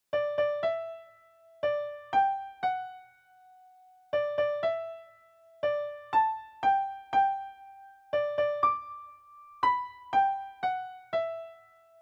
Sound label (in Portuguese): melodia